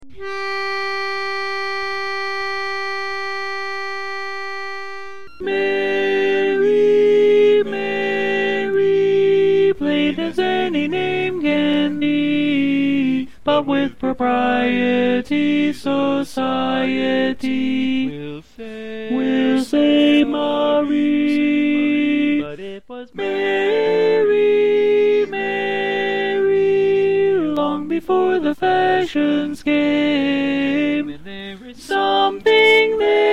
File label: Tenor